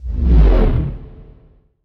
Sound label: Scifi Forcefield Disable; Energy & Forcefields